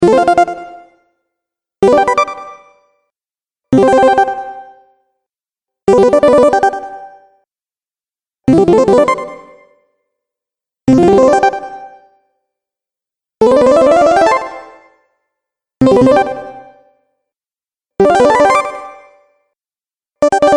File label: Bonus Points, Video Game Sound Effects